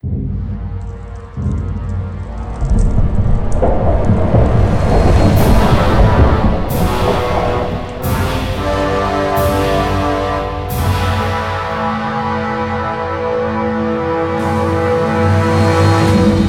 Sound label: Boss summon; Shade Wrath of Angels; windows gamerip 2004